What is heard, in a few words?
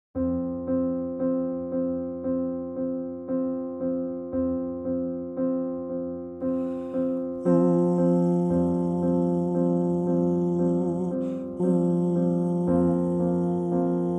Winter Bird Bass